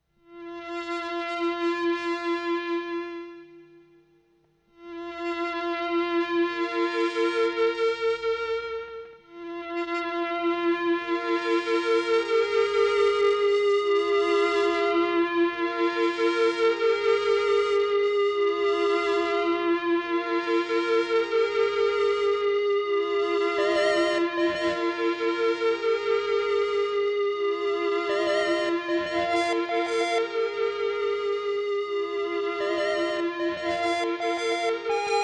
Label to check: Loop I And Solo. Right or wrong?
Right